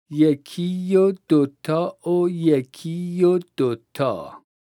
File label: vocalization, Daf